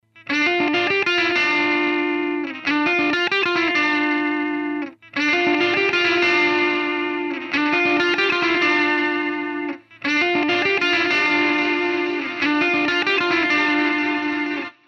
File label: echo; orinj